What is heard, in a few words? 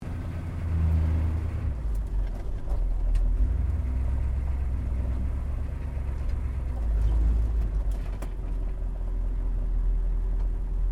4WD HUMVEE SLOW SHUTDOWN INTERIOR, US Airforce model Humvee; Trucks; Vehicles; SoundEffects-Foundation1